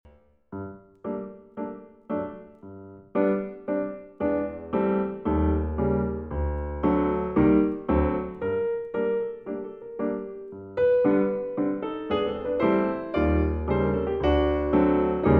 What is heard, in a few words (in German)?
piano, Klingeltöne; karl frers